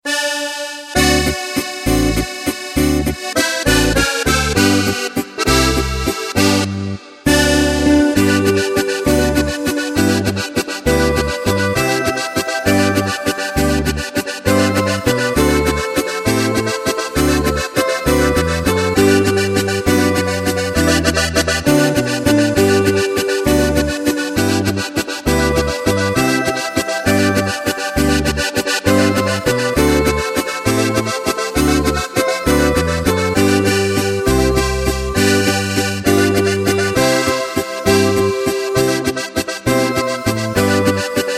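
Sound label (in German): Kärntner Heimatwalzer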